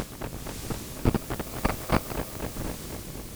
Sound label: Processed Noise